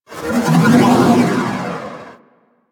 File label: Shield Random; DE; Warframe; Weapons; Archwing; Powers